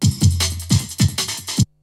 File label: BREAKBEATS, TECHNO